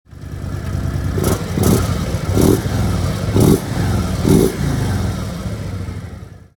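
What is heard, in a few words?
touring 2 1 dynamic mode, exhaust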